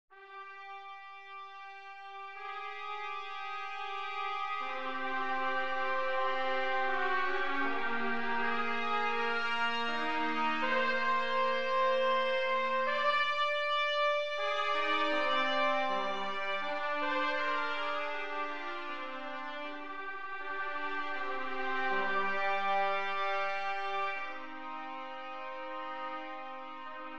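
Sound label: Fanfare 2 for 2 Trumpets, Brass Fanfares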